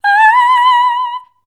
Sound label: B5, Voice